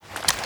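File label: weapon foley glock reload lift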